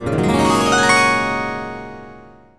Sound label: Magic harp; Fanfare; 1997 MMX LAN PACK (win 95); Worms Armageddon